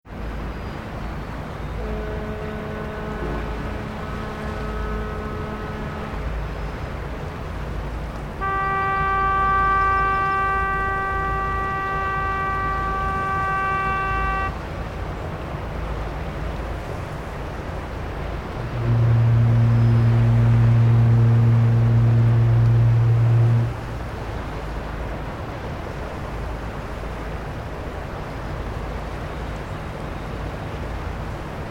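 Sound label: Fog Horns, Isle Royale National Park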